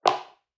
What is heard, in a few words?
footfall heel; soundscrate